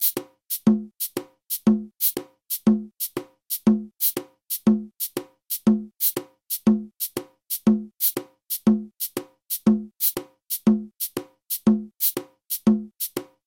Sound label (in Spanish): abakua matanzas opiapa; Les sociétés Ekpé